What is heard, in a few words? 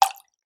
water drip; sound effects one